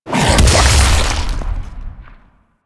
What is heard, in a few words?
Infested Femur Slam Impact; Warframe